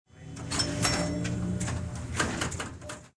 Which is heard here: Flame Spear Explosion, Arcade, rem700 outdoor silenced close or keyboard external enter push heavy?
Arcade